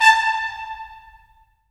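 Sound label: brass trumpets staccato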